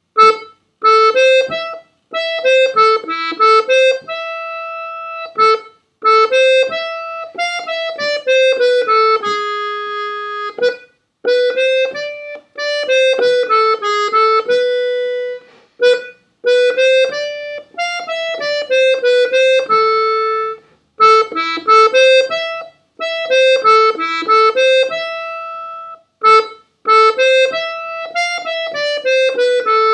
Esperanza MD lent, accordeon

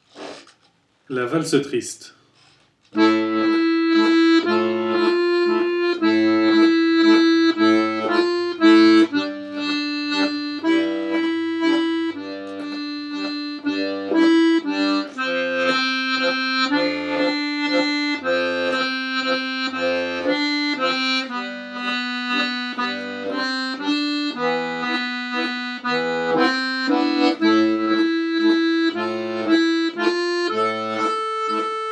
Valse triste, accordeon

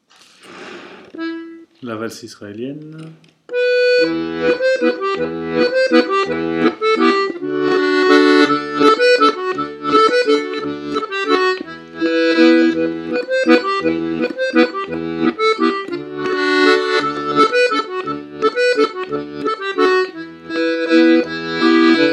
accordeon; Tum Balalayka

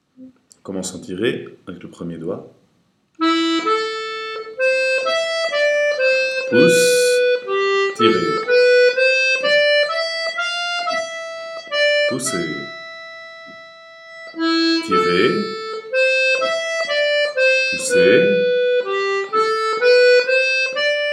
accordeon; Rondeau des chiens battus MD

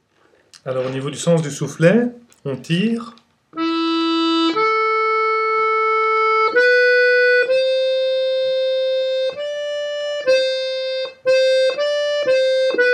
Astrid Valse MD soufflet, accordeon

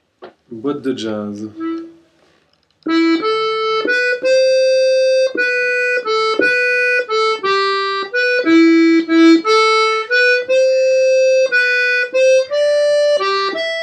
Boîte de jazz, accordeon

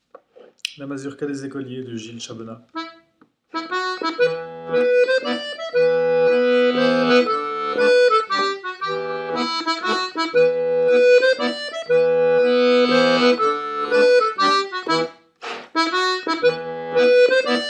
Mazurka des écoliers, accordeon

